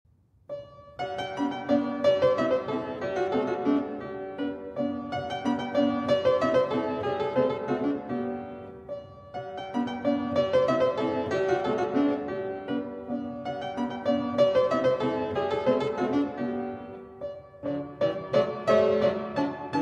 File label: German dance; haydn joseph